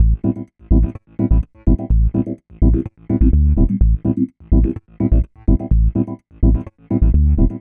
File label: 126 A COMBI GROOVE, funky; Loopmasters; Funky Underground Vol 2; COMBI GROOVES